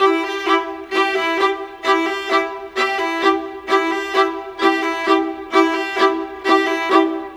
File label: Viola, Cinematic; Sonic Foundry (Sony Creative Software); Orchestral Series VOL-3; Cinematic 14